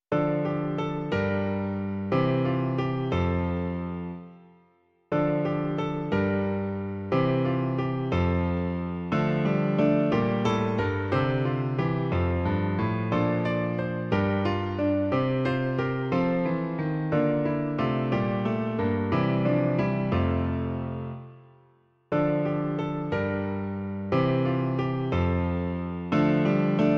klavír, Klavírní verze; Sláva, sláva na výsostech Bohu

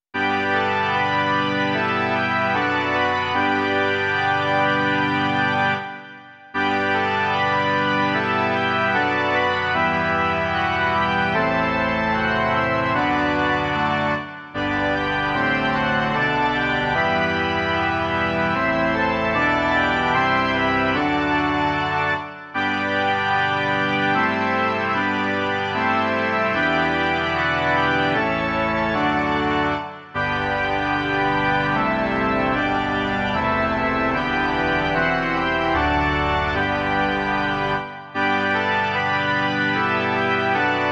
varhany; Jeden Pán, jedna víra

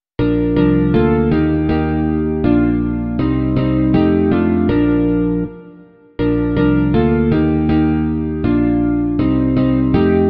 semiakustická kytara a baskytara; Slož, co srdce tísní; Imaginativní verze

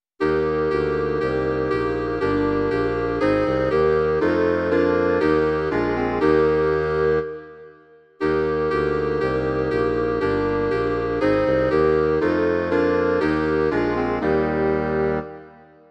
2 klarinety, fagot a kontrafagot; Slyš, kdo v tiché noční chvíli; Imaginativní verze

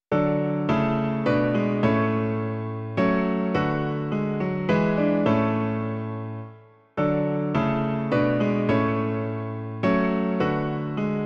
Bohu čest, sláva nejvyšší - klavír; Klavírní verze

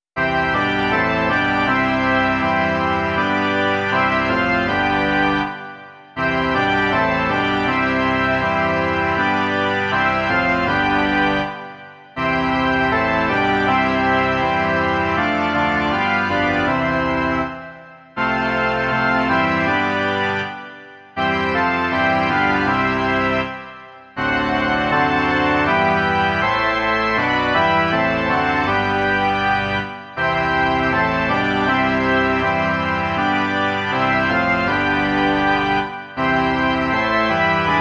varhany

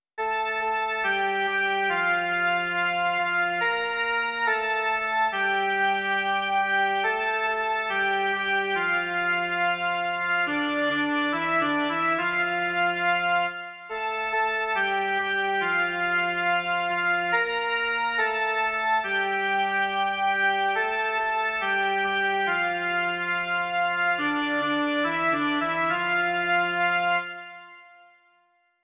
jen melodie; Kde je dobrota a láska

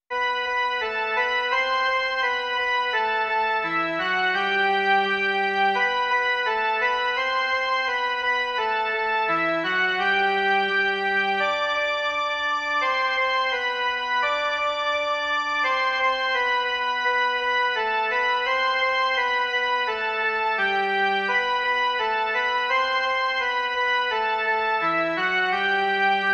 jen melodie; Čas radosti veselosti

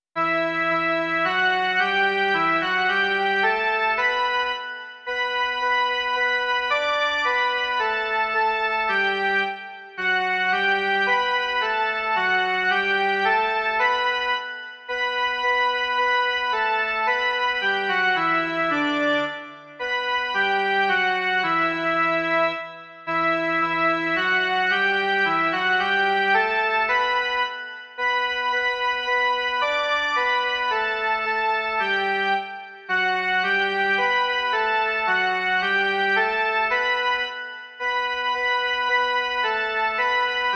jen melodie; Chvála Bohu na výsosti

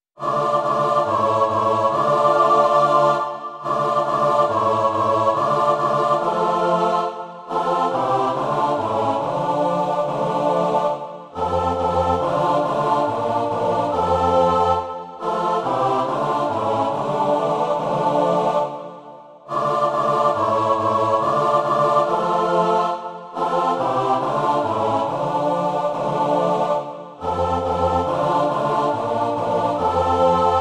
pěvecký sbor; Všichni věrní křesťané; Imaginativní verze

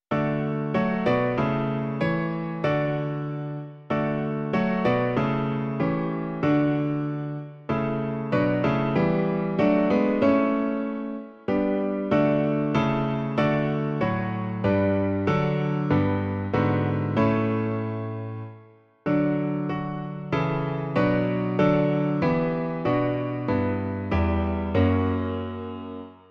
klavír; Můj Pastýř Pán Bůh sám; Klavírní verze

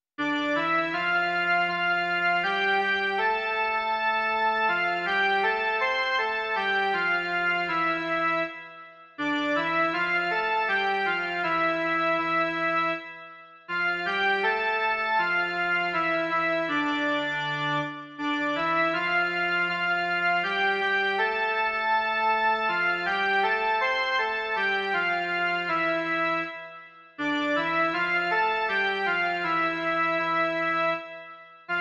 jen melodie

